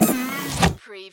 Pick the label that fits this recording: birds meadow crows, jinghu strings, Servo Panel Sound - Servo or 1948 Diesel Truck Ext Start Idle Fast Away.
Servo Panel Sound - Servo